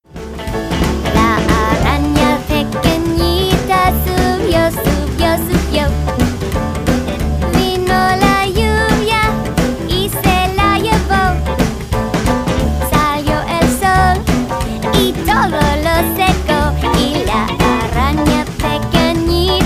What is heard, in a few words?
Itsy Bitsy Spider Spanish; Debi Derryberry